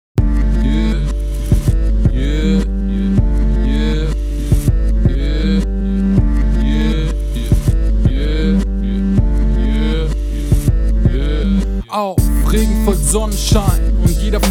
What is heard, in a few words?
16 Bit